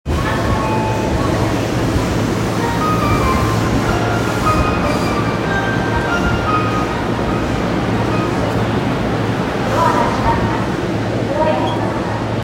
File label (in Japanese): 千代田線表参道西, train sounds